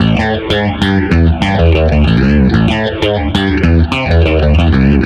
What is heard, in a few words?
Guitars B, 95bpm, analogue circuit; musicradar